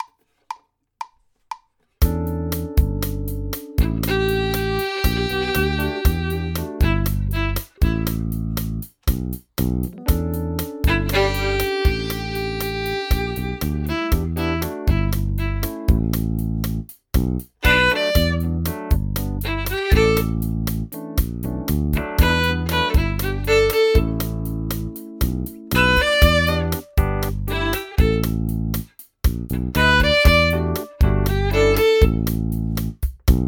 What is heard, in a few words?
Basic 5 Note Solo